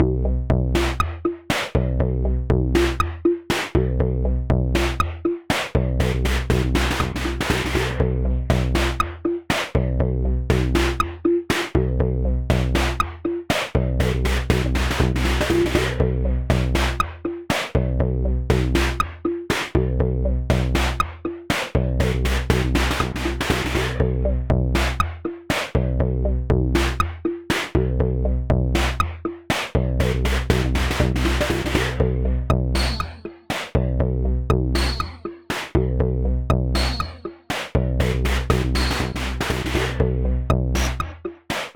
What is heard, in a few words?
120bpm beat fx